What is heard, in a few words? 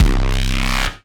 C Bass, DnB One Shots; Electronisounds; DnB Rebellion